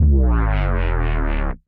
Bass Hits; Ableton; Skitter and Step

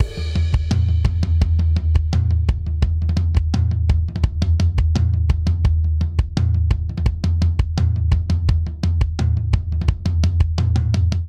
Toms Pop Outro 85 bpm; 4 4; MIDI Clips; Ableton; Factory Packs; Drum Booth

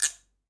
Guiro Up; Latin Percussion; Drum Hits; Wood; Ableton; Factory Packs

